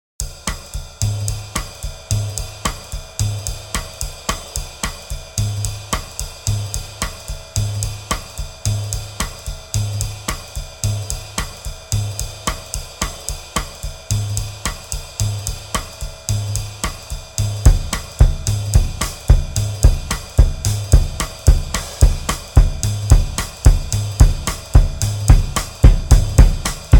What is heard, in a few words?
gypsy road drums